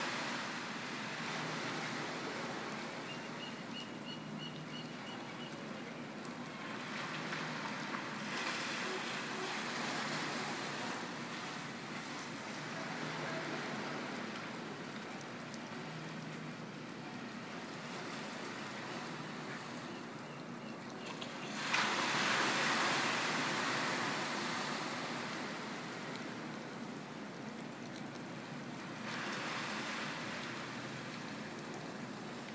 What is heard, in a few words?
sounds from my balcony